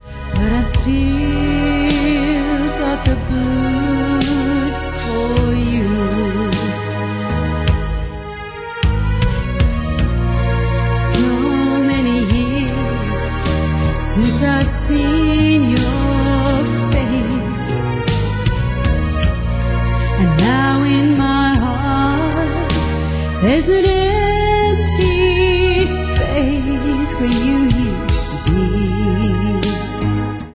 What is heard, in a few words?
blues